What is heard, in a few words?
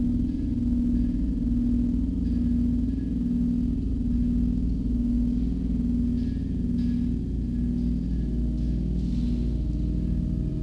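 amb fabrication Room; Star Wars Obi Wan; XBOX; ambience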